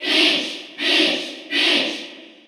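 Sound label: Cheer Russian, crowd; Peach; SSBU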